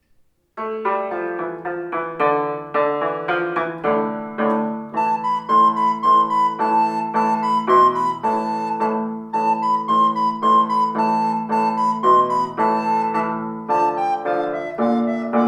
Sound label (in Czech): flétna + cimbál; Megfogtam egy szúnyogot; Flautoškola 2 – METODICKÉ NAHRÁVKY a DOPROVODY